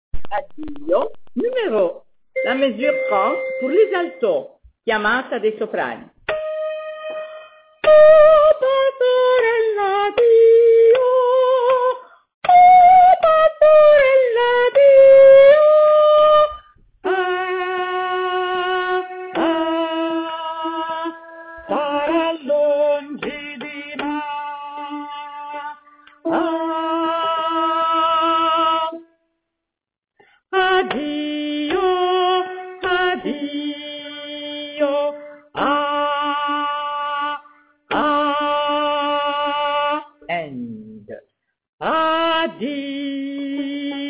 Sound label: pastorelle alto; O Pastorelle addio